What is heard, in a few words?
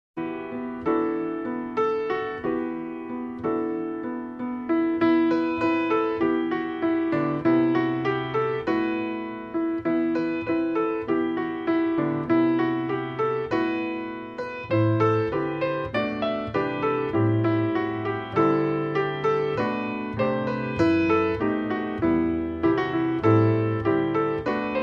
God Rest Ye Merry Gentlemen, Solo Piano